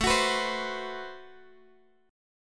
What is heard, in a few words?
A Minor 6th Add 9th A Guitar Open G